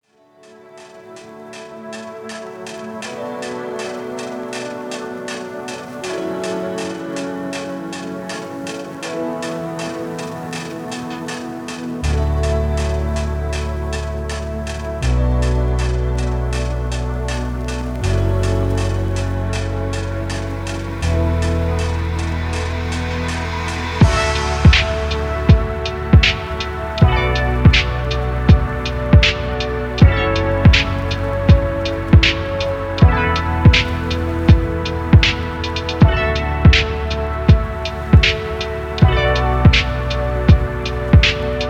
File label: Cool Ambiance